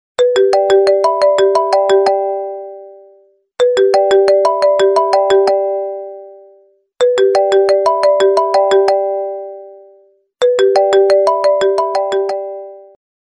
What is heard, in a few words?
iphone ringtone